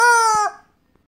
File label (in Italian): pollo; Perché votare